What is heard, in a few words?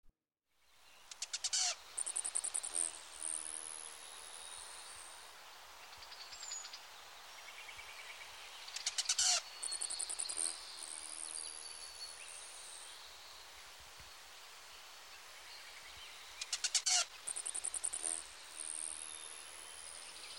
Rufous Hummingbird, Selasphorus rufus